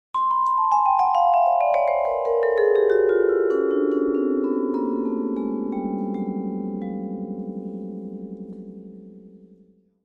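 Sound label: Vibraphone Slow Down; mallets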